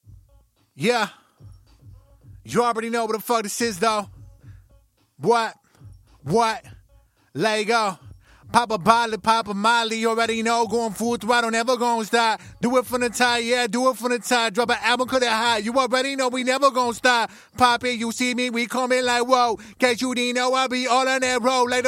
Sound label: 110BPM Key C, Acapellas